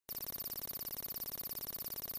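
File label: Computer Read Out High Pitch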